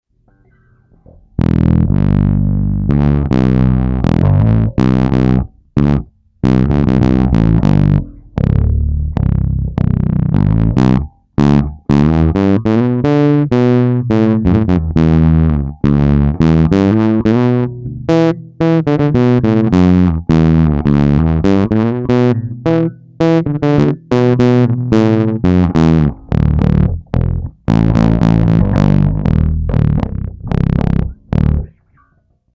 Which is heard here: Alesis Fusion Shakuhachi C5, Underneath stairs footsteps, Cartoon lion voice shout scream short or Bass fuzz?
Bass fuzz